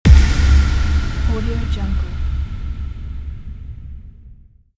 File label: action hit